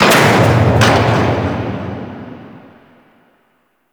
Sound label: Distant Gates, Fortress Ambience; Warframe; Grineer